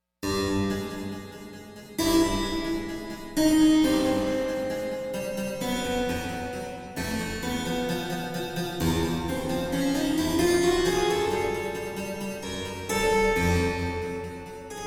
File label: Harpsichord